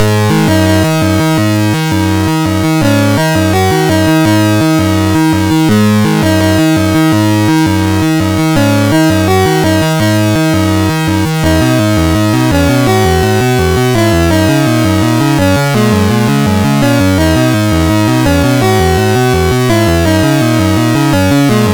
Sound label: Sunburst, MOS6581R4; hvsc